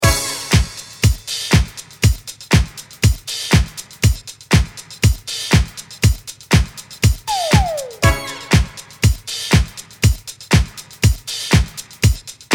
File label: Disco